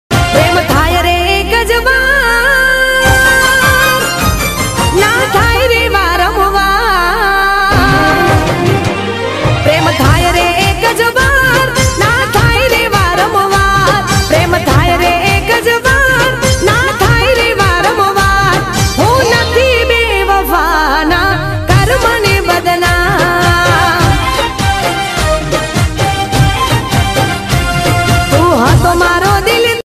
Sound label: Gujarati Song; ringtones